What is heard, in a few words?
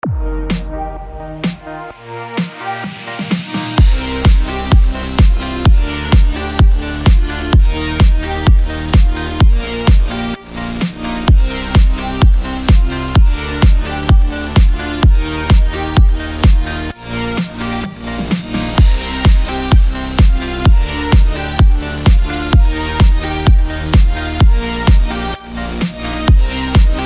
BED ELECTRO CMIN 128BPM